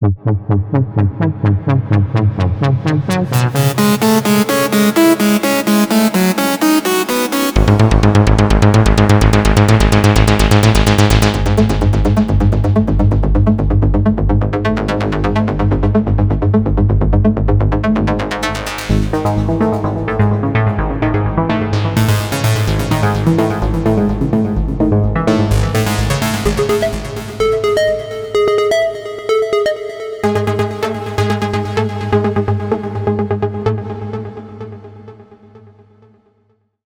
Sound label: ASSET ARP